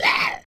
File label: Boss Zombie Die; ZombieBattle; audio zombie